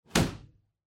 Motion Slam Strong

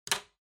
Lighter Put

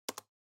PC-Keyboard (Space-Mid)

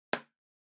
Tablet PC Tap

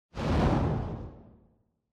Camera Motion; シーン・カメラモーション

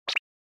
Motion Agility

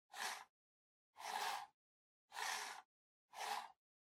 PC-Mouse-Move (Far-Mid)

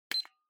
Stopwatch Digital Split Reset

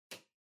Calculator (Far Switch)

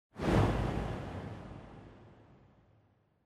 Camera Motion Reverb; シーン・カメラモーション; リバーブ

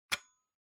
PC Hardware Power Jack Unplug

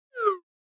Smartphone UI Sound Dry

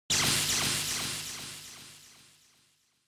Electric Shock Long

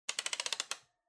Electric Fan Height

